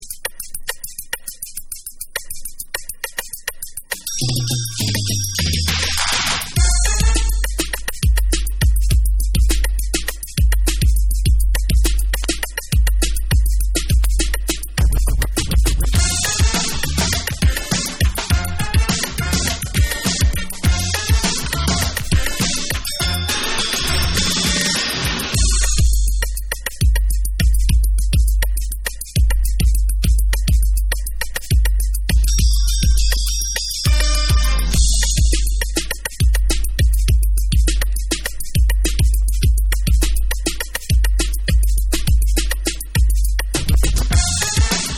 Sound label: Instrumental Mix